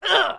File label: damage male